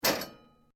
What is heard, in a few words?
metal rods hit; Metal (Sound Effects:Materials)